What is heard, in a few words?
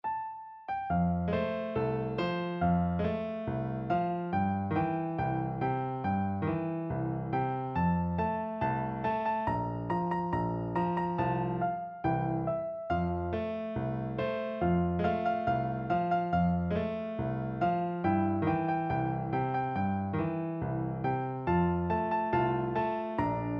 piano, piano duet